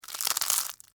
Crunch Sugar Cone, Soft, Waffle; Texture; BlastWave

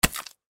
Impact Mud Hit Moist Surface; Low Impacts; Sound Effects:Impacts; Blastwave

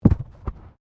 Body movement Fall Heavy; Body movement foley to simulate falls and deaths; Wrestling and Struggling

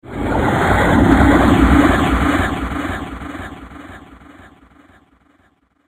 Zombie; Skin Stealers - Zombie Time; Zomby Sound Effects; horror sound effect; Andrew Fleming

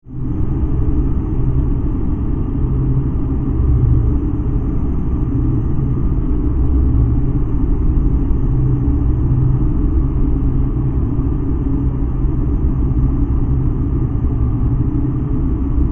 Drone, Alien, Cave, Scifi; 5.1

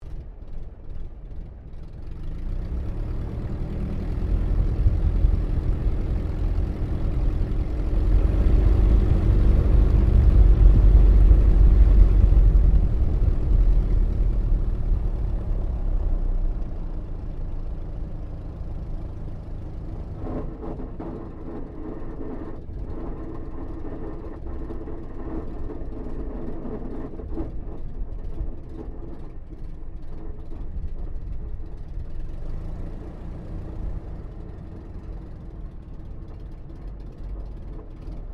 AIRCRAFT DH82A DEHAVILAND TIGERMOTH TAXI INTERIOR; RAAF Tigermoth; Airplanes; Vehicles

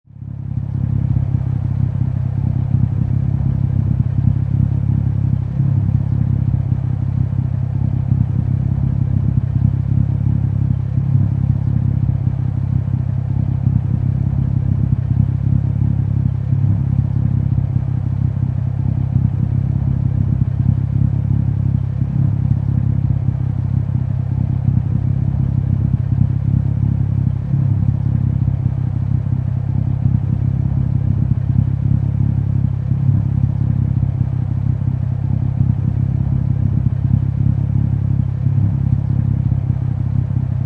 Infinity G35 Exhaust Idle, High Performance Car; Auto, Power Car, High Performance Car Sound: Infinity G35. Exhaust perspective. Idle, Idling, Ticking over.

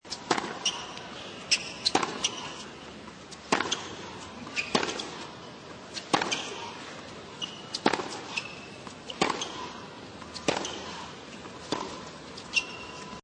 Tennis Playing, Tennis game; Tennis game with crowd cheers

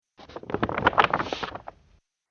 War Map Paper Movement; Paper Sound Effects

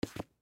Wallet Set Down, Wallet Leather Set Down Table; Foley; Set Downs; Objects; Blastwave

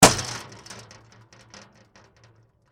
Impact Metal Sign, Street; Crash Sound Effects; Crashes (Sound Effects:Objects)

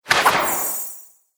Archery Magic Shot, Bow And Arrow Sound Effects

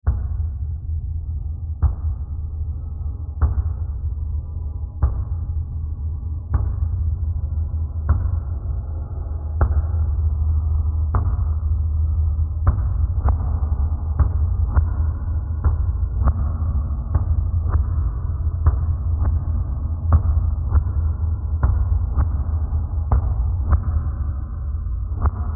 Bed Sequence Hit To Whoosh Reverb; Bed Sound Effects

